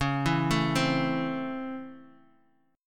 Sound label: Db Minor Major 7th Sharp 5th, Bass Standard; 21,19,19,17; arpeggiated